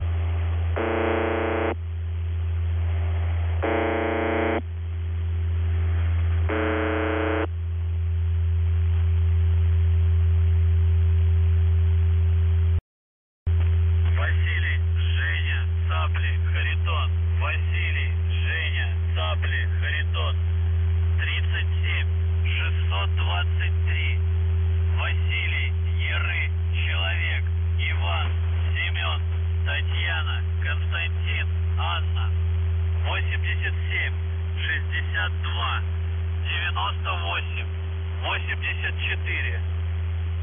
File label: Buzzer